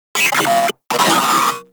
Moa Vocal Attack; Warframe

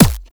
Medic Drone Shield Hit Notification; Abilities; Warframe; Tau; Dax Medic

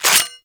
Heavy Shotgun Cock Stop A; Warframe; Tenno

